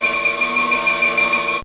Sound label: freeze; 7invasores